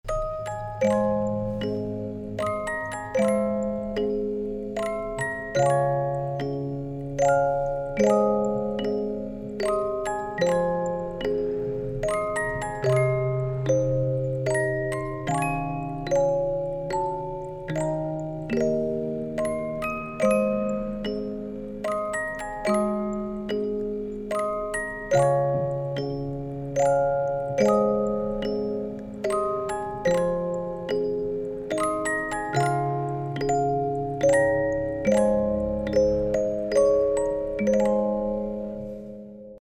Amazing Grace part 1, music box; SANKYO

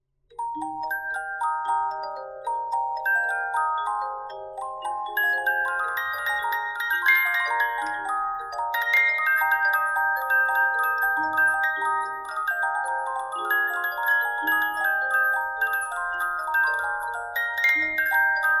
Jingle Bells, music box

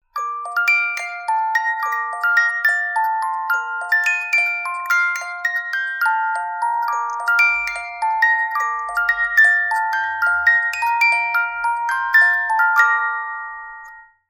Rock A Bye Baby, musicbox

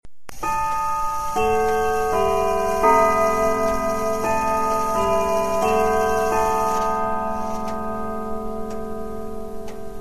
Westminster half hour

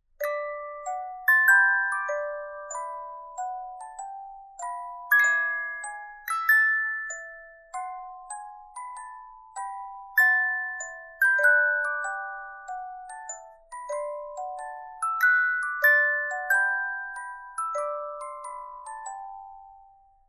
music box

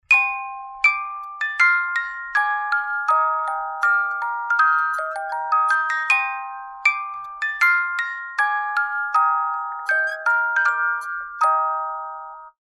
Wedding March, musicbox

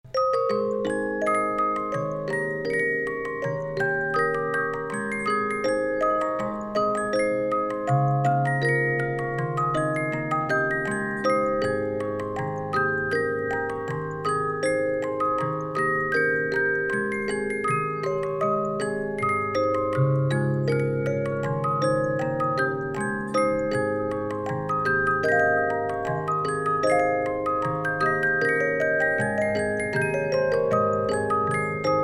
Magic Flute Glockenspiel, music box; SANKYO

